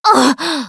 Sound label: hurt minor, russian, Survivor Voice Producer